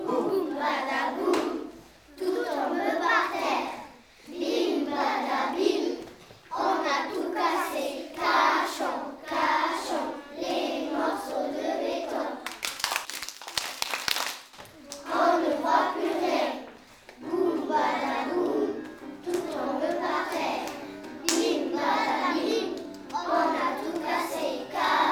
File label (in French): boum